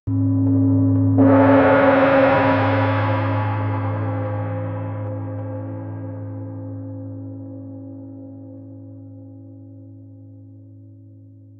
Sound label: drums